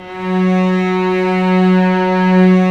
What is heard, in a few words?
STR VCS, Vcs f Amb